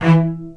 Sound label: Spicato Basses & Celli